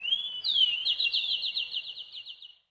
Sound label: bird fall; tap tap dash